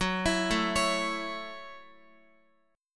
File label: Gb Minor 7th, Guitar; arpeggiated; JGuitar